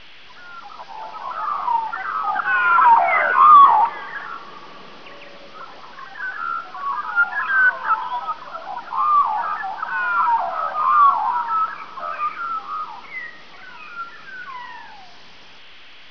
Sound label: magpie; firearms; balcome